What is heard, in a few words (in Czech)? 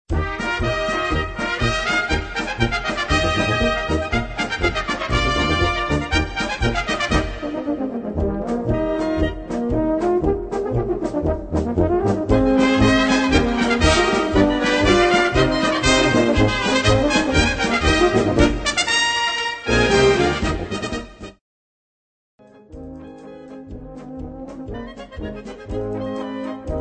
dechova hudba